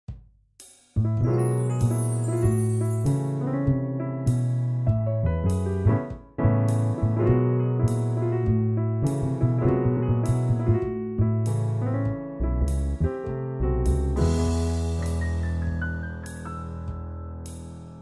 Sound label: Ringtone Jazz